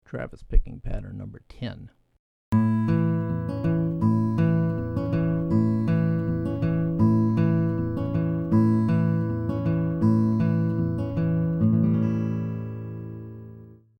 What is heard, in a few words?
Travis Patterns; travis style lesson materials